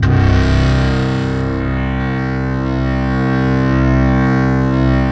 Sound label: BASS FX; SoundFactory